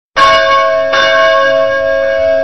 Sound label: church bell